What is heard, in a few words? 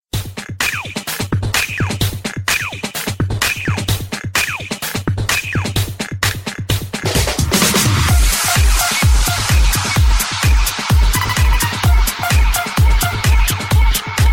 Electro Mix